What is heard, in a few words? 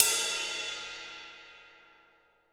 Rock Ride, cymbals; Roland BK-5